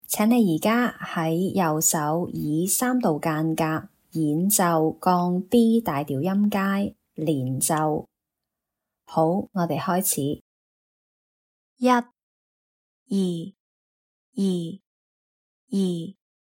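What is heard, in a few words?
B flat major legato scale in 3rds right hand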